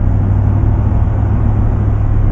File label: ford V8; Mafie 1